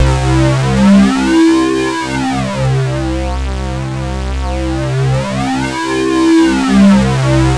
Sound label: JV CLAS LEAD; Syntec; Wall of Sounds